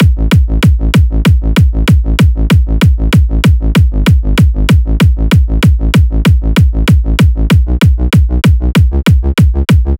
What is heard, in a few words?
KICK BASS